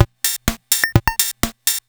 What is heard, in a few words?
sk loop, Casio SK1